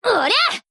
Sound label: chain start